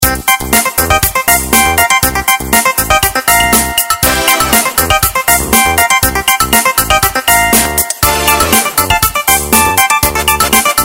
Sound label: Warm, ringtones; Okapia Air